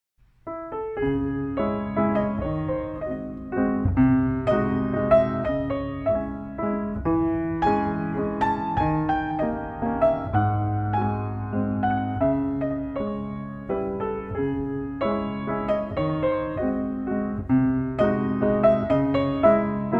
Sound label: Mazurka op.63 no.3 in c sharp minor